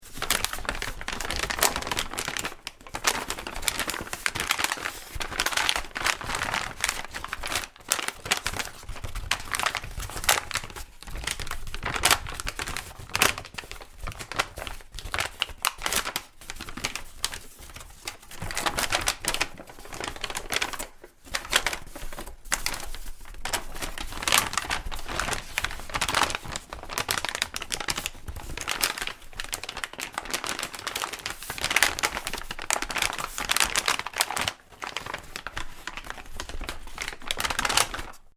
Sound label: Paper Crumple, Long, continuous paper crumble / paper crumple sound; Paper Sound Effects; Paper Crumple 3; Materials